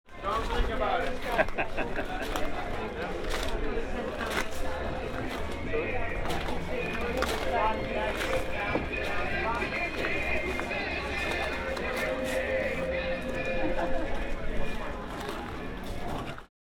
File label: Harbour market; whitstable